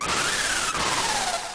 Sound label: Dragon Sword SFX